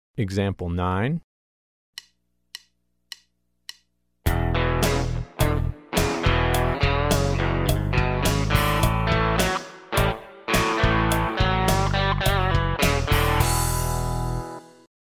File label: Country Rock